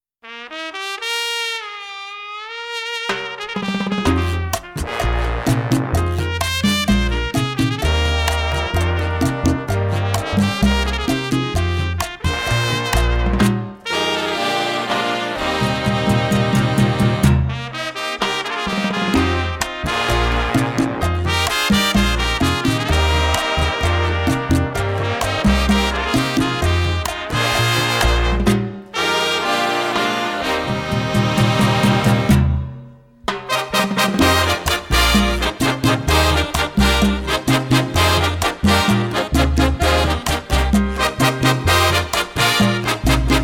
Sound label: Rumba Mambo Cha Cha Cha